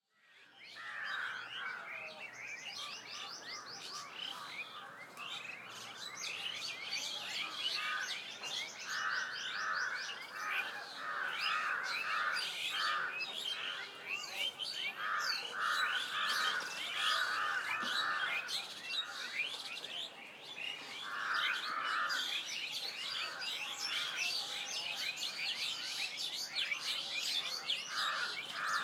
AMBIENCE Birds Medium Distant Village and People, Asian Countrysides; SmartSoundFX